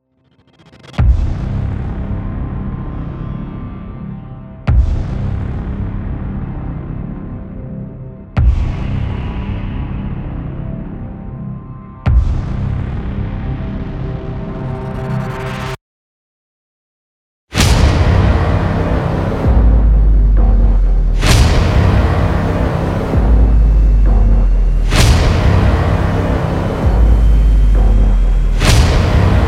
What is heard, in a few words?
Game Epic Music; motivational epic music